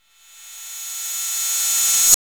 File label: 606 CRSH RVRS, SYNTH CYM; Drums In Your FACE!